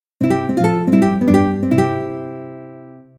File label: Guitar West; Asus Padfone-2